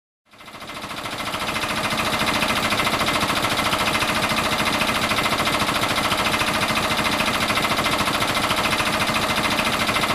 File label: Industrial Miller Machine running and turned off